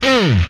Hit, Vocal

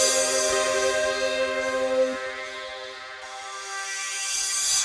Whine Fades, Pads; BB 2HTC Samples Vol 4 Addendum

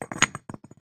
rock falling

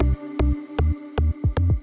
Little Bass Loop; Loops; BB 2HTC Samples Vol 4 Addendum

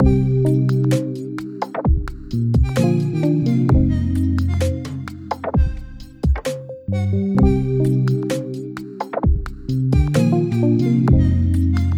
Bloop Loop

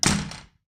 slam

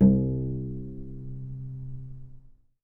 C2 Pluck, Novice Cello

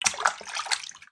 splash; water splash slime sfx

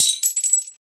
glass breaking; breaking falling hit sfx

